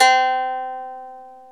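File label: ethnic plucks; Eth Plucks, Pluck Duo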